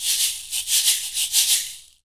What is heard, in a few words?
shaker, shaker loops; Roland LCDP14 Africa VOL-2